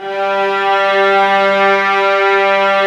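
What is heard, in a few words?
violas, ambience, mezzo forte; String Master Series; Vlas Sect3-4; Amb mf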